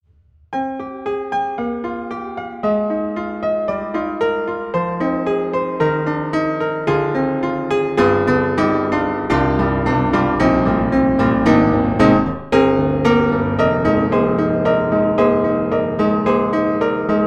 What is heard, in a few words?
Pianoteq D4 Prepared, piano; MODARTT S.A.S.